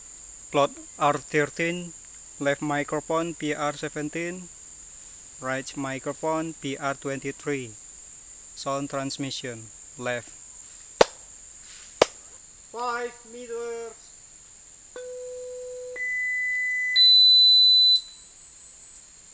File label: birds